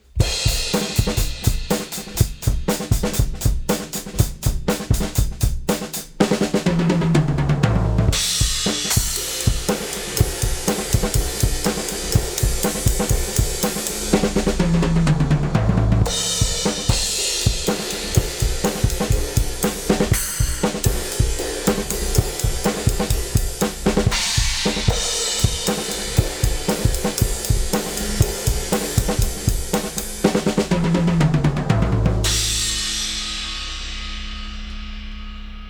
drums; Pulsar 1178

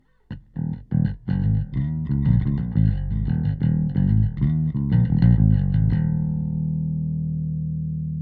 bass Bass Weight and Definition; SplitEQ

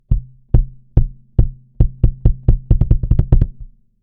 kick bypass; Joe Chiccarelli

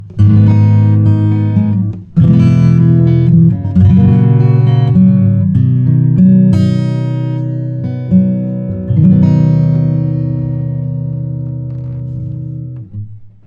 80 Series EQ low boost 220Hz; Lindell

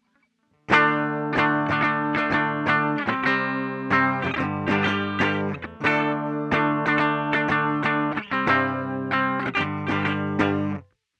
Solid jazz; MTurboAmp

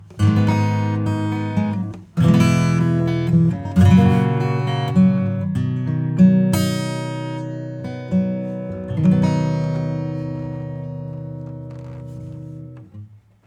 acoustic; Dirty Tape

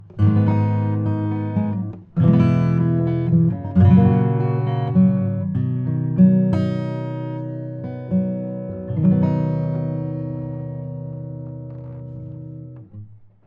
80 Series EQ High Cut 10KHz; Lindell

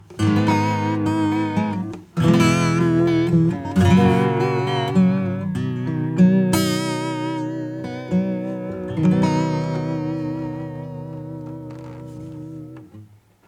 acoustic; Analog Pro; Tape Intense

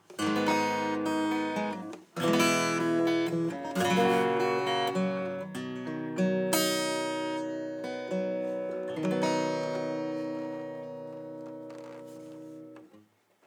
80 Series EQ high pass 360; Lindell

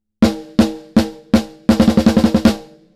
808 Nice Saturation; Kelvin

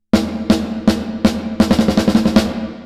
Reverb Snare; Seventh Heaven Professional

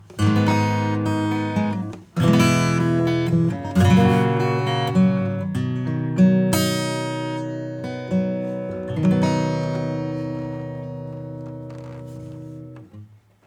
Acoustic

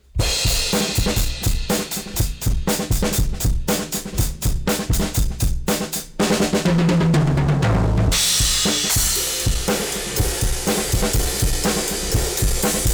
drums Break

